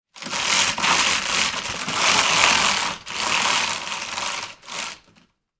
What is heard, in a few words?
Roller blind cord pull roll up; blinds